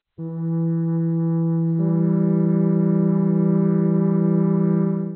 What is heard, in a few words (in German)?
flöte e1 g1; Intervalle, die man durch Potenzen von 2 und 3 darstellen kann